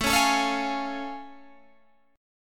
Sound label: A Augmented Major 7th A Guitar Open G